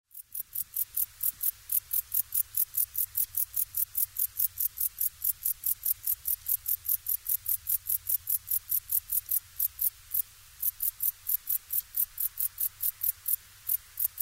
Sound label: bog bush cricket